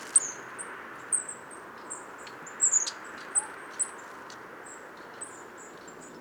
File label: gcrest regulus SC FC; kehrberg